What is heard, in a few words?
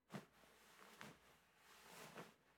Cloth Bed Movement; Fairlight Sound Lib